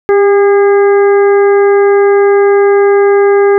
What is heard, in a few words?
composite 400Hz dynamic phase shift alt; KSP-440; KSP-400 次期モデル・オープン開発